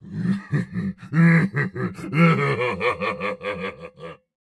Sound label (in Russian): Смех Фраза; Турбосвин